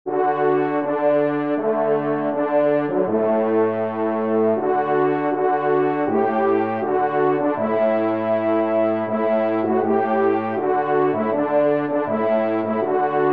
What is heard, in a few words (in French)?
polka, trompe; Polka des joyeux piqueurs